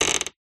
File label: household door creak